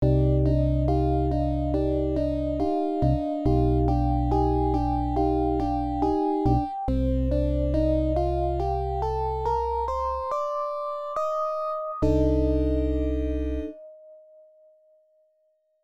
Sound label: Going Underwater; Eye of the Beholder III: Assault on Myth Drannor; IBM PC AT